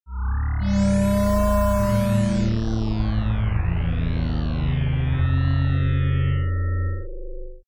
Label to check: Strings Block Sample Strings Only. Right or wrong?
Wrong